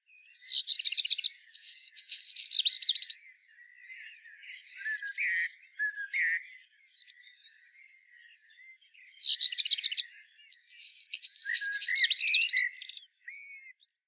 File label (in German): Hausrotschwanz Phoenicurus ochruros; Phillip, der Buchfink